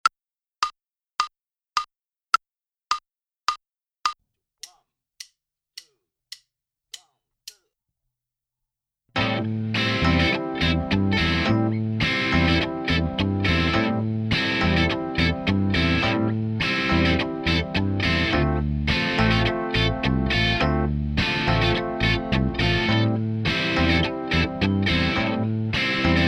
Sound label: Minus Drums; Inst Ensemble